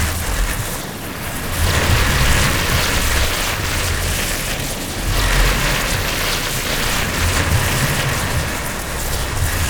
Sonic Dissipation Loop; Warframe; Focus; Vazarin

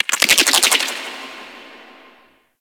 Ocean Underwater Plant Chatter; Warframe; Grineer Ocean

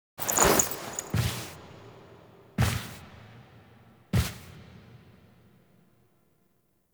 Foley; Sealab; Hunhow's Lair; Stalker

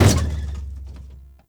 Stadium Floor Tom Destroy; Warframe; Ambience; Nineteen Ninety Nine

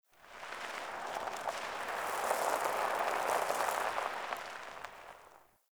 Tire On Cement One Off; Warframe; Ambience; Nineteen Ninety Nine

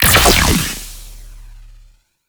2D Med Charged Shot; Crp BFG

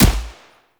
Assault Rifle Fire; weapons; Warframe; Grineer

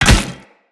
Hammer Impact Armor Damage; Weapon Impacts; Player; Combat

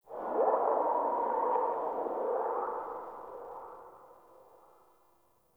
Covered Vine Area Low Chirp; Ambience; Misc Outdoor; Warframe; Infested Eris

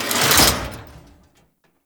Shelter Door Close; Tau; Grineer; Doors

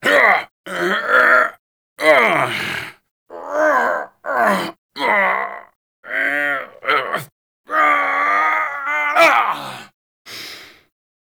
Struggle; Cinematics; Throne Room; Teshin

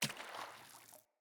Sandals Run Water, Footsteps; Player

